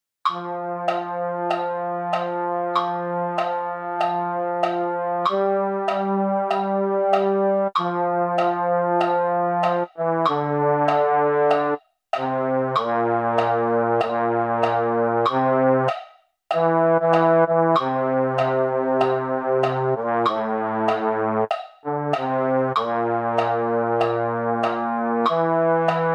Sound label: baritone, MIDI; mini corps